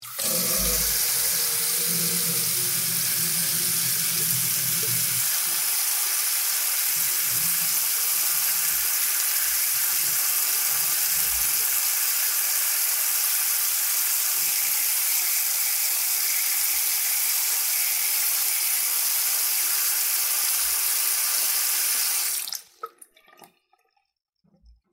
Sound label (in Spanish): efecto de sonido agua del grifo